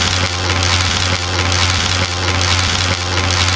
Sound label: lightning exp, weapons